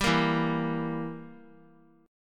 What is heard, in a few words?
E Diminished down; Edim strummed; JGuitar